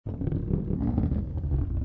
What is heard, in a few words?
Roll Stone Wood